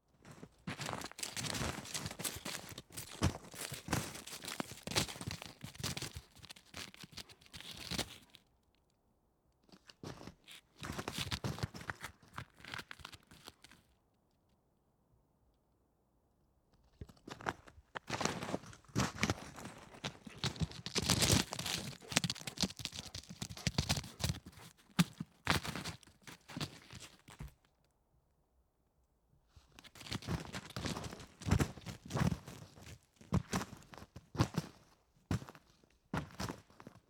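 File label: sounds of footsteps on ice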